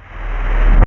REVERSE BD, Drums; USB Soundscan vol.11 - Drums Dance & Groove; AKAI

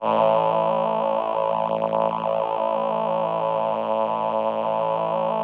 VP-330, String Machines

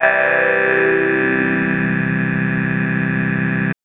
Synth VoiceSweep maj c2; TrashLibrary

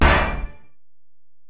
Bell, Alesis DM-PRO

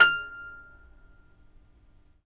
CEL HARP F5, Historical Instruments; USB Soundscan vol.23; AKAI; CEL.HARP1

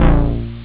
bass; Amiga Soundtracker Sample Packs

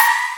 FX HAT, Techno Trance; E-MU Formula 4000 Series Vol. 2

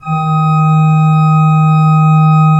SWL D2, Cathedral Organ, SWELL WERK; Propeller Island

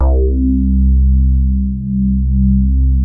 RAP BASS C2

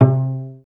CLS PIZ C2, Orchestra; Best Service; Peter Siedlaczek's Advanced Orchestra

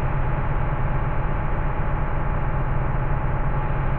InfiniVerb Noise, sparse soundscape Loops

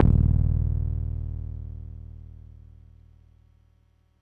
PAFMBass d#1, Yamaha YX81Z

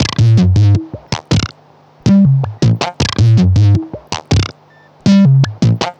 Modular Loop, Modular Percussion

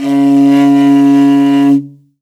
R, morin khuur, World Instruments; Best Service ProSamples vol.52 - World Instruments 2; AKAI

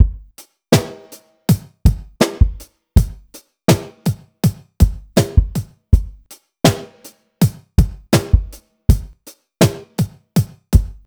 Drum Kit, Electro Lounge; Sonic Foundry (Sony Creative Software); 081 Laser Love

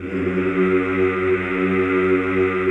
CHO F#1, Aaahh Yhh; Sound & Vision - Gigapack I CD 2 (Roland)

